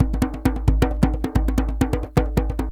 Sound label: DJEMBE GROOV; Best Service; World Colours